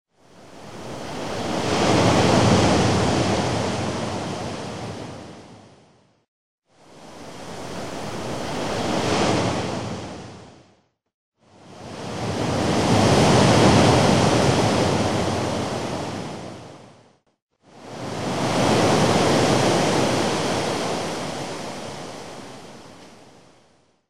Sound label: Beach wave; OutRun 2006 Coast 2 Coast